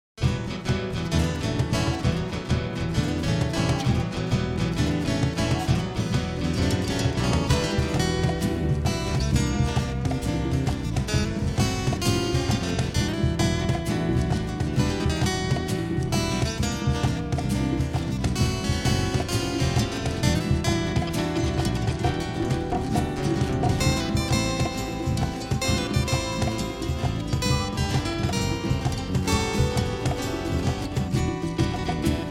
acoustic version

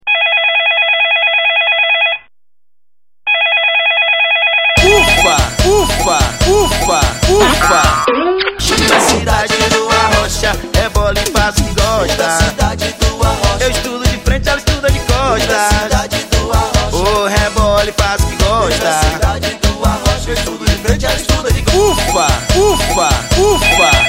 ringtone

